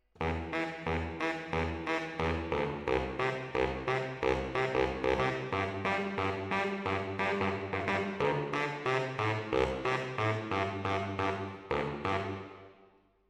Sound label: MKH AB, piano